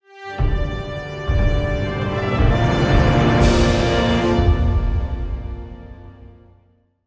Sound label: Stingers MISSION SUCCESS 120BPM